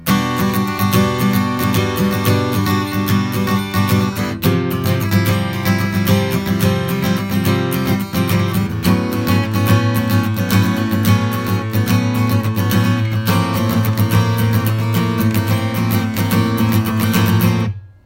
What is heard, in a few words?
Intro Acoustic Guitar